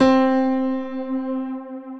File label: Grand Piano Pad; Ableton; Factory Packs; Grand Piano Hybrid